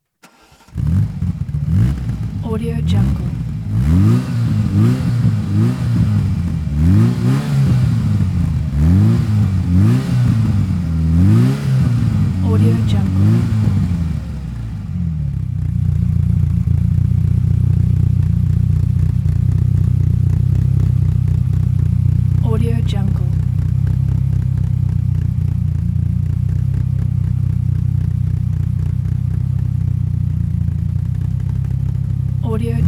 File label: ferrari308 car hollow ticking engine start; prosoundfx